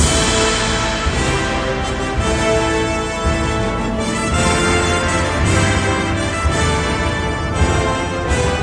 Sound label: loop; The Lord of the Rings The Two Towers CD Cardz Warriors